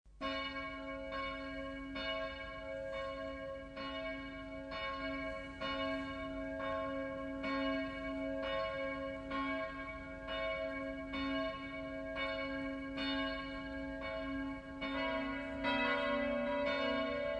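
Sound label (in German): Tonaufnahme d1 fis1 a1 h1 cis2, mehrstimmige Geläute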